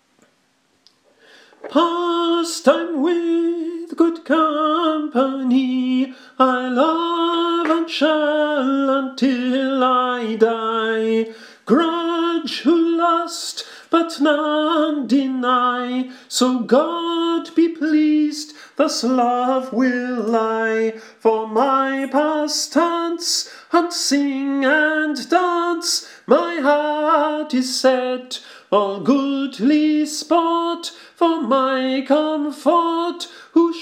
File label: Pastime with good company Tenor